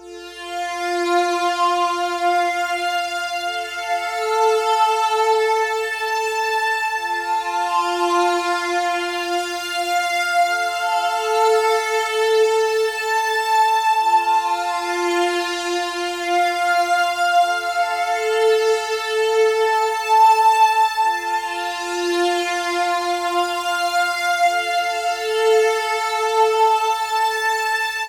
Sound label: synth FAm; blindmime; atticus